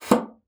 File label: BASSOON; Xsample VOL-6